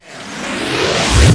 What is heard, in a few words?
Sweeps Short